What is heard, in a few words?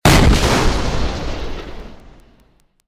cannon; mcheli